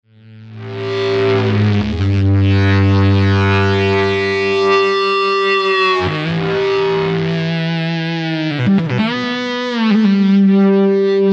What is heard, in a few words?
triplemode lead